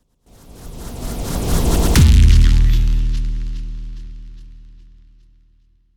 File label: robotic whoosh impact strong break hit